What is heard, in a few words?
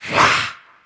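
voice charge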